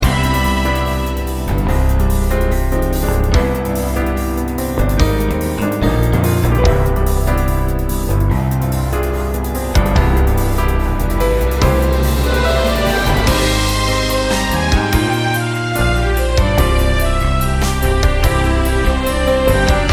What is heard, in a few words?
Result Loop; Tasogare no Gradsil